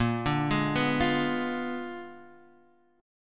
Bb 7th Flat 9th Bb, chord; Bb7b9; arpeggiated; JGuitar

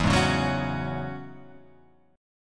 E 6th Add 9th E, Guitar Open F; E6add9 strummed; JGuitar

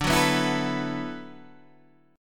D 6th Add 9th D, Guitar, Open G

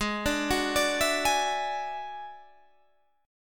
F sharp 7th Suspended 2nd Sharp 5th, G sharp

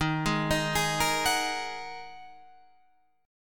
A sharp 7th Suspended 4th Sharp 5th D sharp

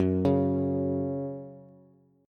Gb 5th Gb down Bass; Gb5 arpeggiated; JGuitar

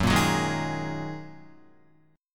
Gb 6th Add 9th Gb down Guitar; Listen to Gb6add9 strummed

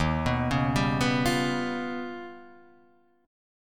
Eb Augmented 9th Eb down Guitar Half Step Down

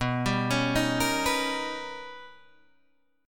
B Augmented Major 9th B Guitar Open D

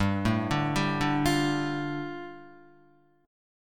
G 7th Suspended 2nd, Guitar; arpeggiated; JGuitar

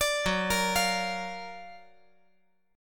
F sharp Augmented Major 7th F sharp; arpeggiated

